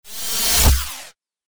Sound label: wipe